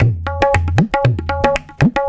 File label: Tabla